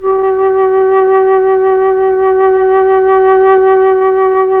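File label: FLUTE Mellotron Flute G, Mellotron Slow Combo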